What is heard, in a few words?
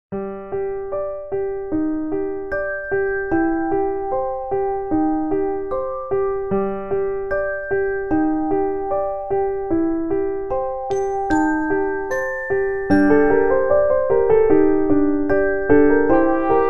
Flute Mix; Lost Innocence; silent hill 2